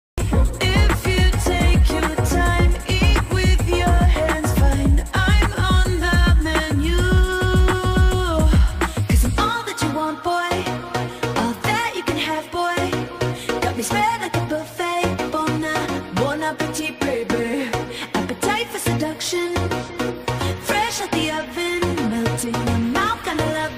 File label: english ringtone